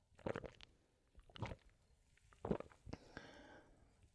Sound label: Swallowing